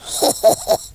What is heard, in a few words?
laugh; Capone